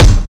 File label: trap bass drum single hit c sharp key; kick